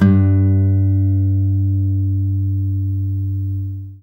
ACSMEDPICK, Guitar; Quantum Leap; Guitar & Bass

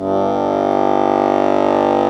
Bass Accord; Club-50 - Foundations Roland; Accordians

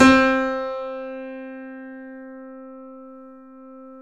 KEY, YC7; Roland L-CD701; ff YC7 Mono

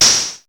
NOIZE HIT, ENSONIQ ESQ-1; Rubber Chicken Software Sample Bank

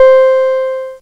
lead; Mono

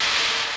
brushes; Crypton - DrumBase5000

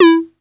808, CONGA, Electronic

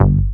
bass Bass; Best Service Gigapack 2

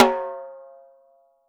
6X12 WOOD, drums; Living Drums

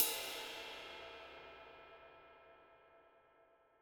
Ride, 135bpm; Zero-G Sound Sense Grime Scene; Construction Kits; A Positive Vibe

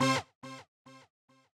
Juno C2, 140bpm, Grime; Zero-G Sound Sense Grime Scene; Construction Kits; Astoria

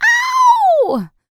Vocal, R&B & Dance, FEM. HUMAN

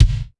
Mega Drums, Korg 01W

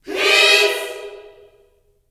peace chord, gospel chord; Vocal Planet Vol1 Gospel

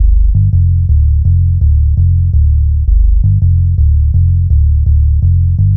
Long Bass, Bass Lines 166.5; Jungle; Zero-G Jungle Warfare 1, 2 & 3; 90 s Sample CDs

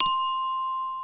BELL CRACK; Back In Time Records; Big Bang

